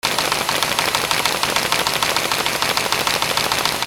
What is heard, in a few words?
INDUSTRY